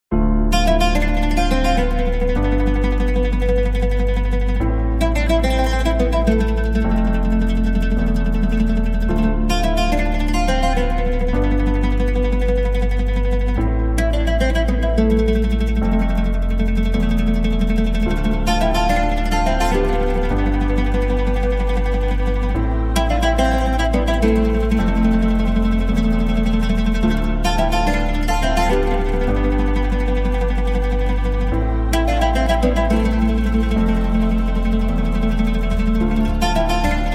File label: oud arabic version 128